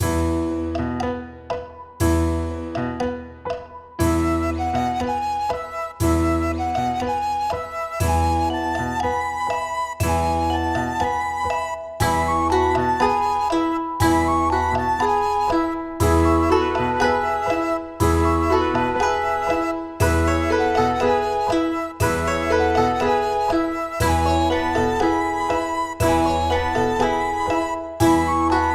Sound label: karaoke; Liang zhi lao hu